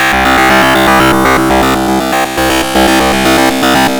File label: GRUNGE